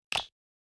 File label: Click